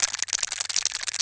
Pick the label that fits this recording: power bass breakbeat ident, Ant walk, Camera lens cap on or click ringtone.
Ant walk